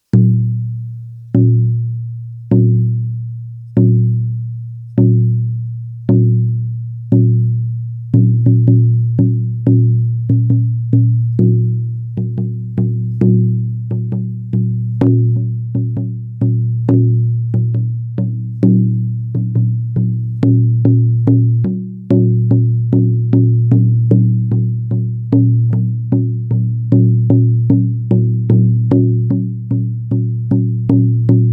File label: saman holy, samansky bubon 45 cm